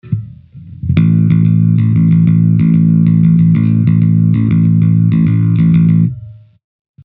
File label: picking